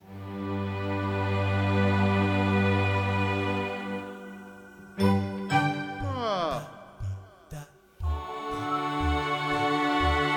Lazy Ambient

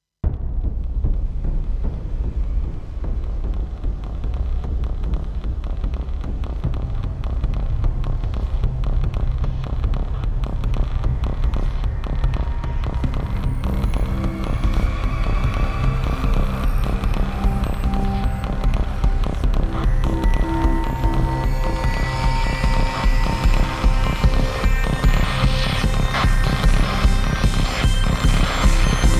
Throb; Gothic Storm Music; Brain Warp (Sound Design Trailer Tools)

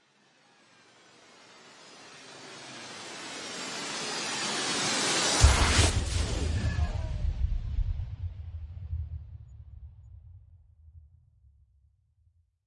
Storms Meteor

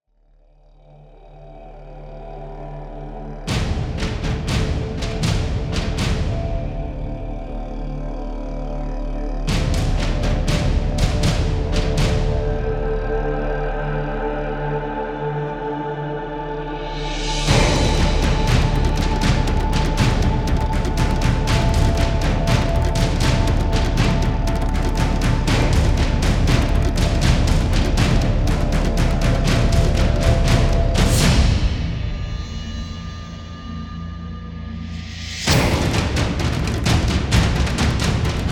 Tribal Rage, Relentless Percussion; Gothic Storm Music

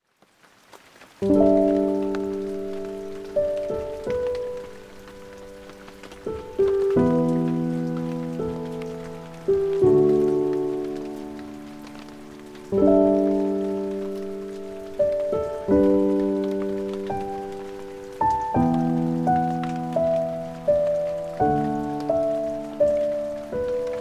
Calming Piano Music with Rain

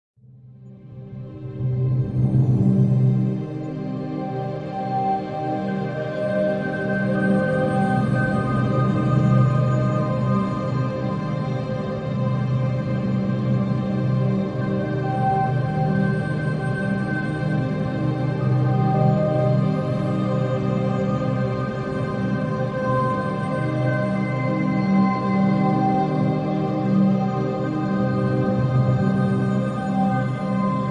Mystic Vista Static Version; Gothic Storm Music; Drone World 1

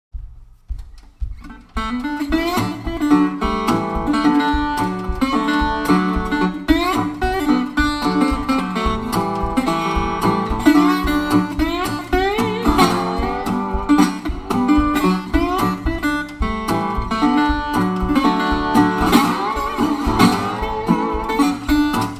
Train Song (Country Blues)

